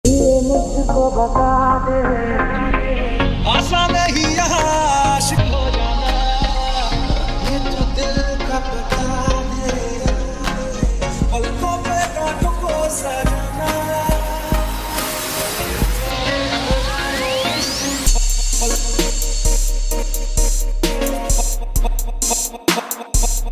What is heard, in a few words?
trap ringtone